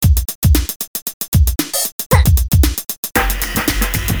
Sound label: depressing rock music